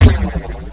saw fire

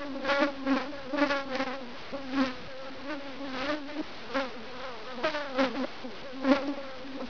ambience flies

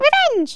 stadium; svencoop2

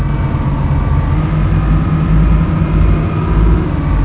machine

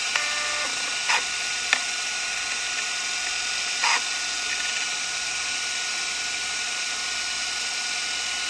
hdd rev up loop

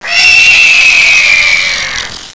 pit worm alert

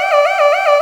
big alarm

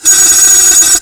alert

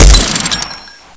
minigun shoot

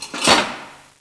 machine

